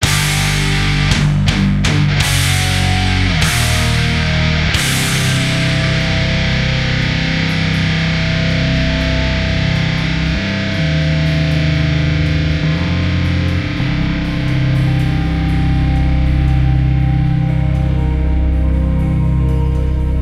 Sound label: Game Over; Dungeon Hearts; iOS, Linux, macOS, Windows